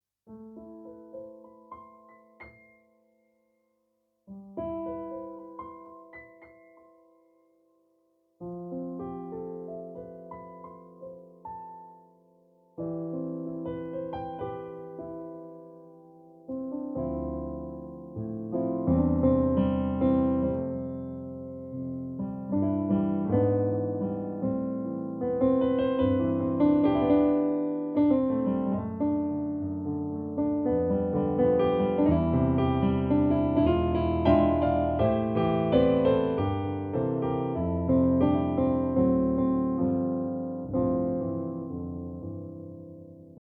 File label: Roland GP 3 Piano Reality Grand